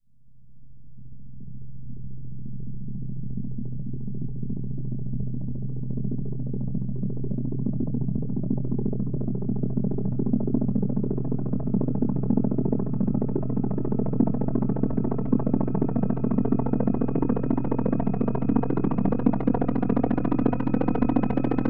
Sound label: helicopter; designing sound